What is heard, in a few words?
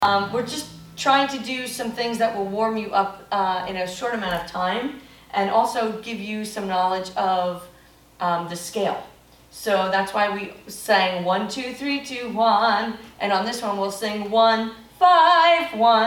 Vocal Exercise